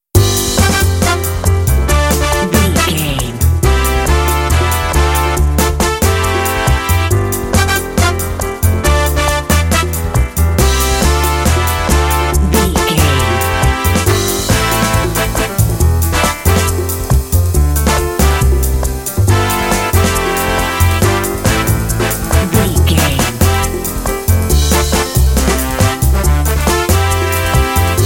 retro tv theme no melody

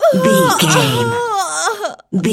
voice female death

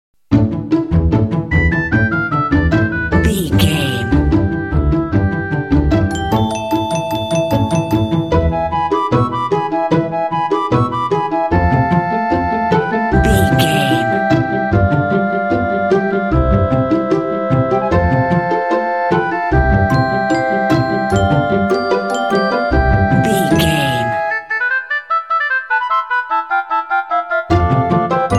cartoon story time

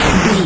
retro explosion general

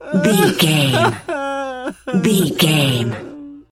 voice male b cry whimper high

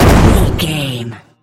explosion large no tail

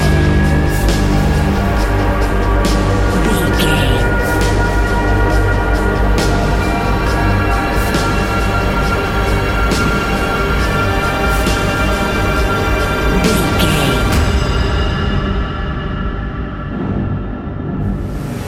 suspenseful echoes resonate

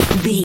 footstep grass land

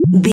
retro misc bass sound

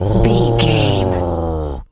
wolf growl

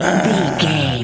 gorilla angry

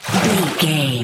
footstep water splash med wading

